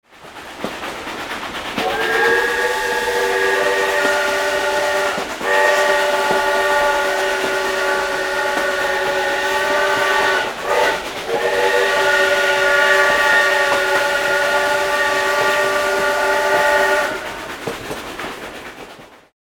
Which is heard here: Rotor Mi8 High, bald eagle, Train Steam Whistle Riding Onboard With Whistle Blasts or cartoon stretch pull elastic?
Train Steam Whistle Riding Onboard With Whistle Blasts